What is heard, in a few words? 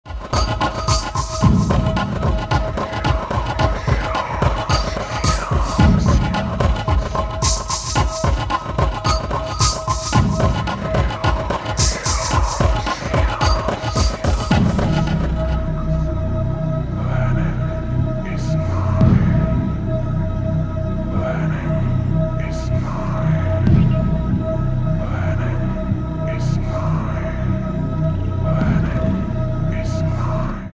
death metal